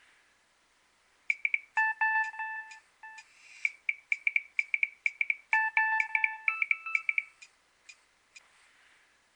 nokia3100 ringtone

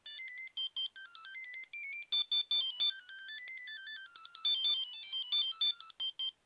ericsson t39 ringtones

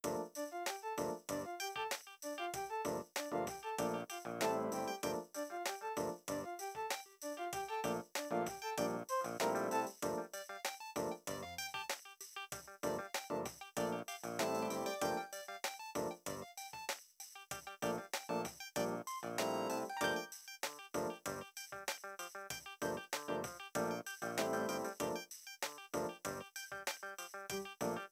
nokia 2600 jig ringtone

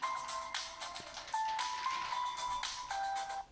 samsung galaxy y ringtones; polaris

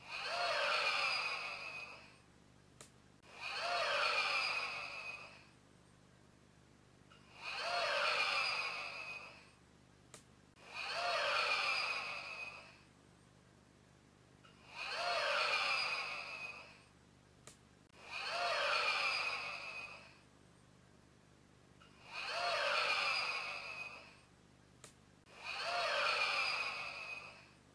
nokia 1200 amazement ringtone

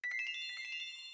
nokia 2600 reminder alert ringtone

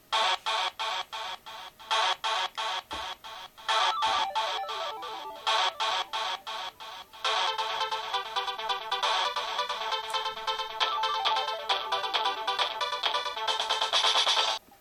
samsung sghe700 ringtone

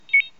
nokia 3510i polite ringtone

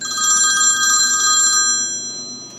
old phone ringtone; apple iphone 3g 4

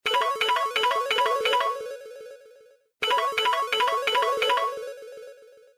samsung galaxy s4 basic tone ringtone

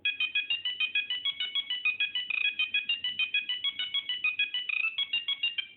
nokia 1100 ringtone; critter

